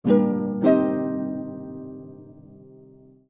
quest completed; Mount&Blade Warband

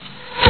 arrow hit ground; Mount&Blade Warband

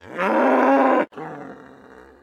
cow slaughter; Mount&Blade Warband

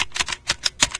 keyboard slow, machines; ambient; Garry's Mod; hl2

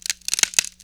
wood strain; physics; Counter-Strike Global Offensive

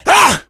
become enraged, infected

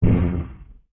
Grave Hurt; Sid Meier's Civilization 4 Complete; Beyond the Sword; Units

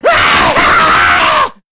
jockey loud attack, voice; Left 4 Dead 2

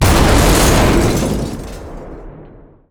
ships destroyer attacked; Hearts of Iron IV Death or Dishonor

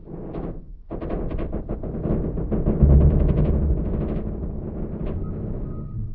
rusty pipes; materials; Garry's Mod; hl2

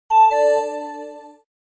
notify

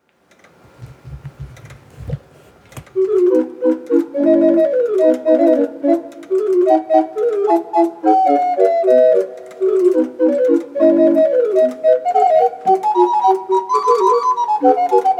Organ, Mechanical Instruments; Speelklok Museum; Haydn Niemcz

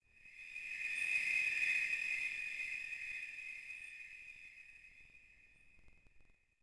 Choir Pad; Ambient; Sid Meier's Civilization 4 Complete; Beyond the Sword

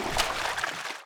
slosh, footsteps; Counter-Strike Global Offensive

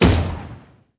infantry cannon; kindjal; Emperor - Battle for Dune

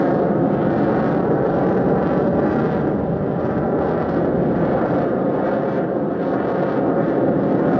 distance airplane jet idle; Hearts of Iron IV Death or Dishonor

